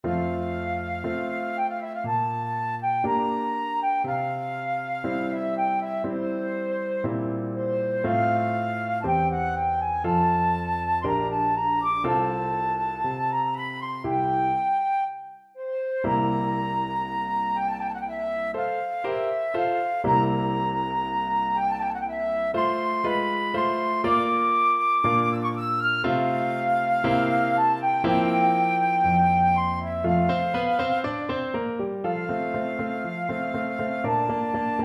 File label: romance, flute